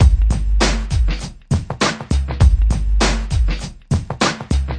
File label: swing and pop, drum loops and breaks, 100 bpm; 091 to 100 bpm